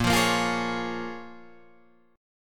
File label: Bb Minor 7th, Guitar, Drop D